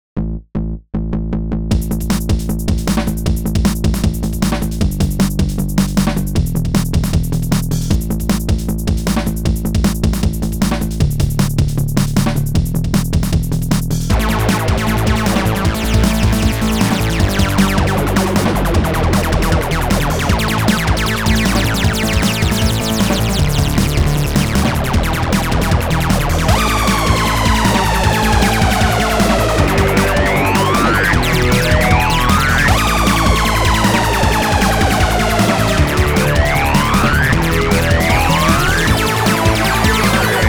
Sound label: Drum And Face